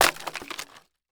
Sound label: Crash Wood Debris On Wood; Crashes